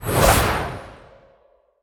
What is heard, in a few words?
Wand Bright Attack Underlay